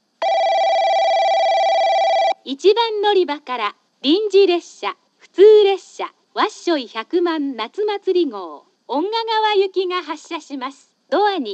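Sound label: summer festival; 戸畑駅 発車放送（遠賀川行き）; natumaturi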